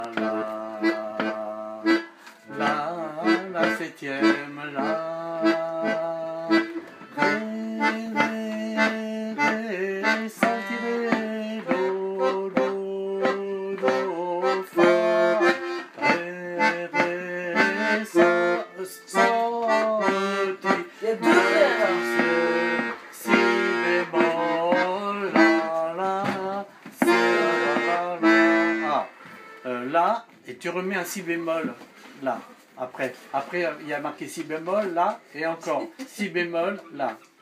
Jovano Jovanke Accords Chantés

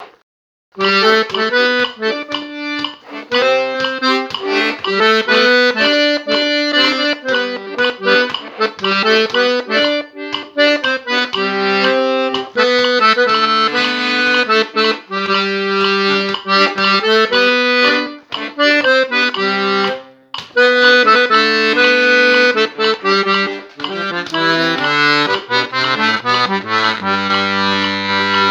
Melodie

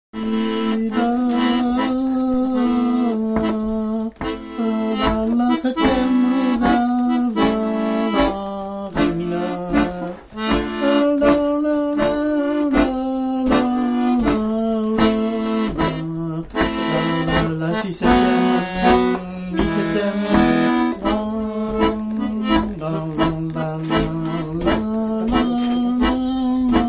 Swideshka Lent; Swiodeshka

